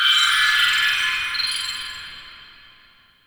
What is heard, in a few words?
High Tech; Warframe; Guild